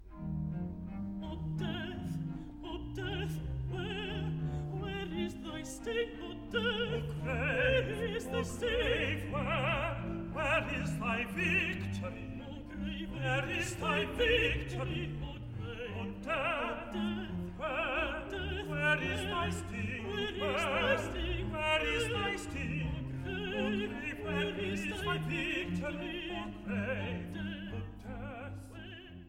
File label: alto, tenor; part 3, no 50, o death, where is thy sting